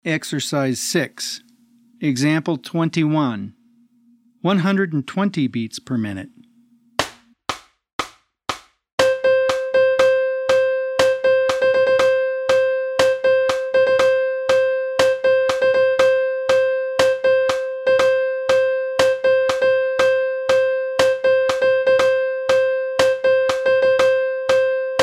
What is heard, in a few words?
120 BPM